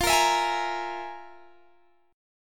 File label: F Minor Major 7th Double Flat 5th, Guitar, Standard; FmM7bb5 strummed; JGuitar